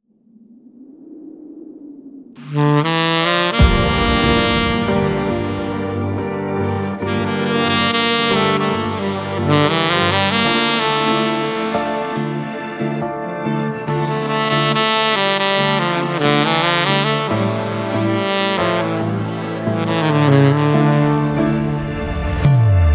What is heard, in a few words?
saxophone cover; казахстан бурабай боровое